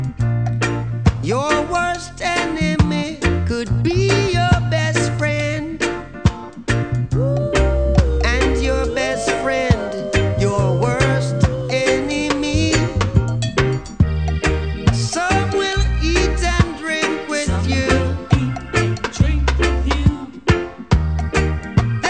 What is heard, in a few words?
reggae